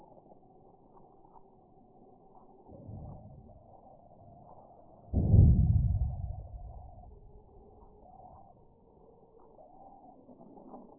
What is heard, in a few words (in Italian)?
San casciano VP, Sonificazioni Eventi Sismici; San Casciano in Val di Pesa Magnitudo ML 2.9 Prof. 6.0 Km 21/12/2014